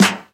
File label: Snares; Vengeance Samples; VEH2